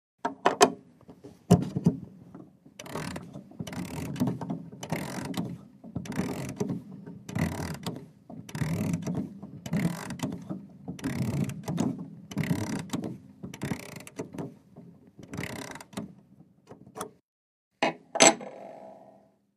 CLOCKS MANTLE CLOCK: INT: Winding a 1880`s large mantle clock, with key set down